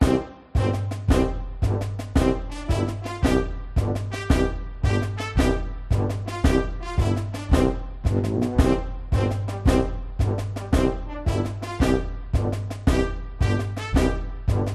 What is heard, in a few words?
cartoon marching military band brass melody